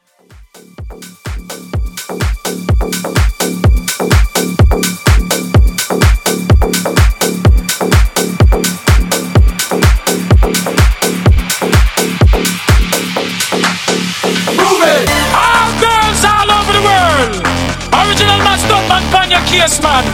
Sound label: Tech House 126BPM